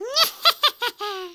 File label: laugh; Classic